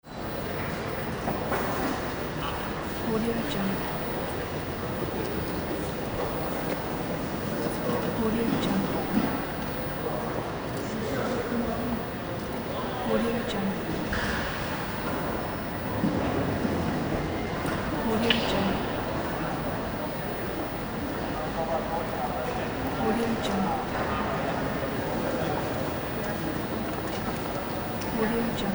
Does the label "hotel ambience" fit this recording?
Yes